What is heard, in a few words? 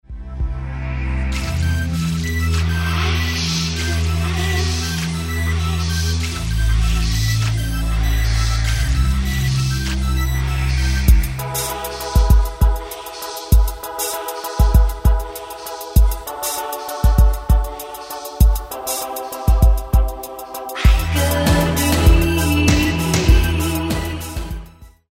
distort